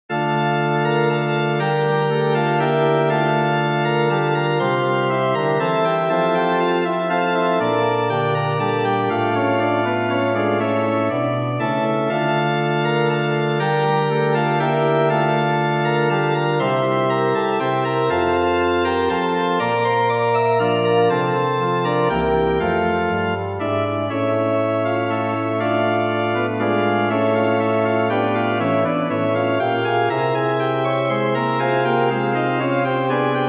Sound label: prelude in D minor